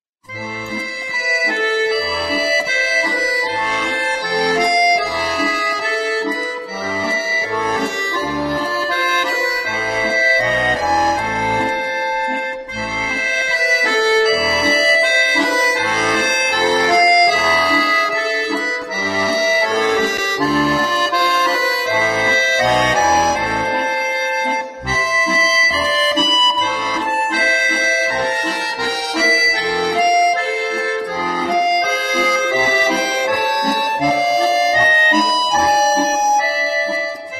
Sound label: Sad Song, bayan